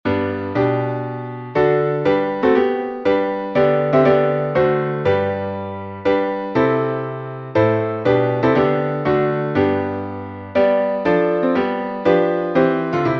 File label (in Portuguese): salmo playback